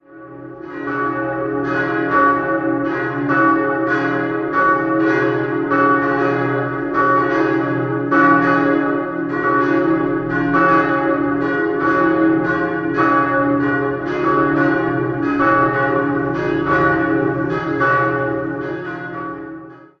Siegburg, glocken; Servatius

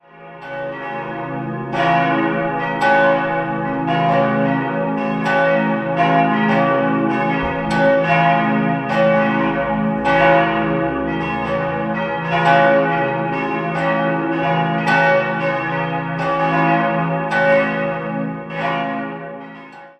Hohenwart, Glocken

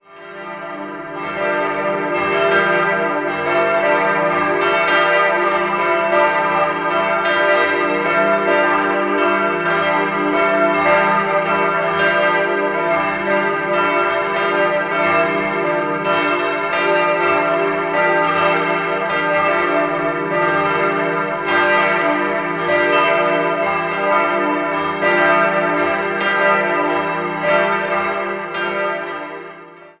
Scheibenberg, Glocken

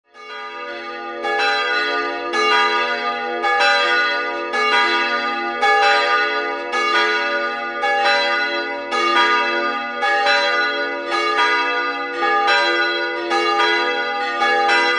glocken

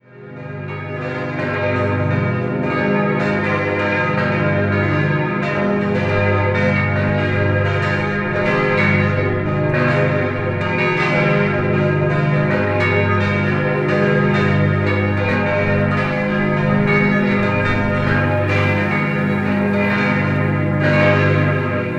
Pfaffnau, glocken

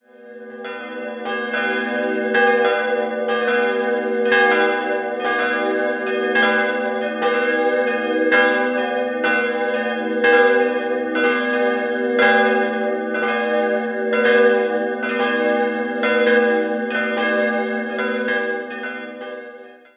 Atzmannsricht, glocken

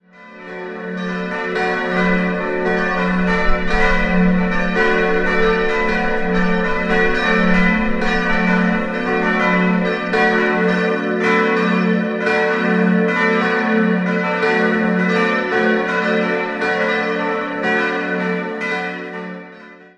Duernsricht, Glocken, Pfarrkirche St. Albertus Magnus; Rudolf Perner in Passau gegossen: Glocke 2 im Jahr 1993, die drei übrigen im Jahr 1962.

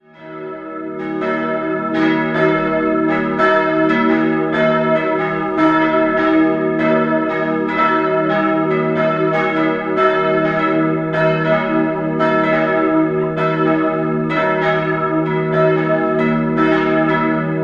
Rottach-Egern, Pfarrkirche St. Laurentius, glocken; Sterbeglocke von Bartholomäus Wengle (1620)

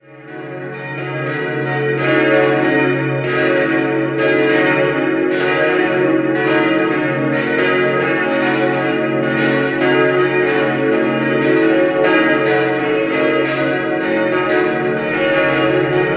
Traunreut, Stadtpfarrkirche, glocken; Zum Heiligsten Erlöser; 1955; 1963; die kleine einen Umguss einer vorhandenen Glocke

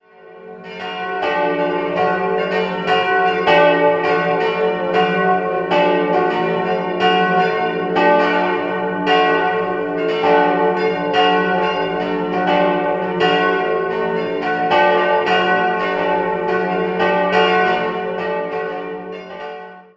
Alteglofsheim, glocken

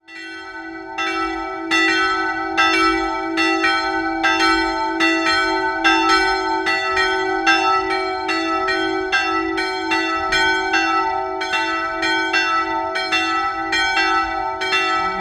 Altmannsberg, glocken

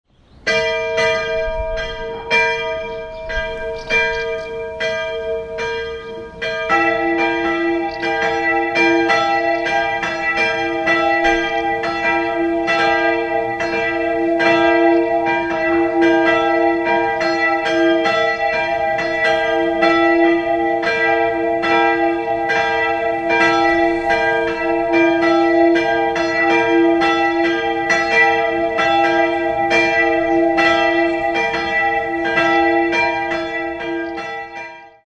Baiersdorf, Glocken

